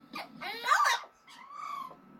Parrot I love It

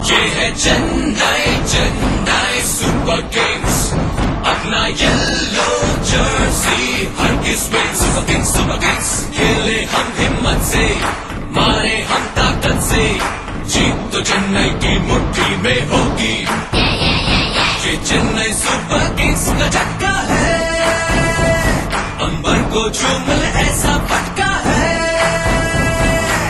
Hindi Song

